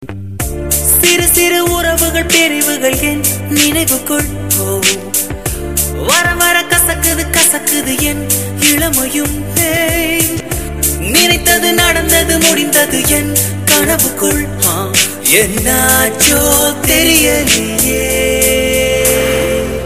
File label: Tone